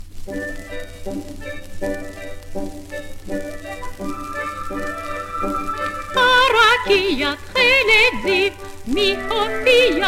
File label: for Voice and Orchestra; Parpar Aviv